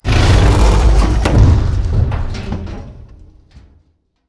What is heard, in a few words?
bridge break; ambient; Half Life 2; Episode 2; outland